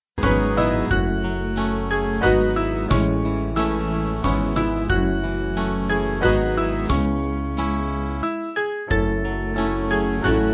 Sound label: Transformation Band